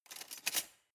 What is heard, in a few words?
cutlery large drawer rattle soft